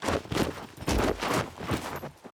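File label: crowd kneel snow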